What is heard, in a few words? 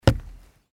footsteps wood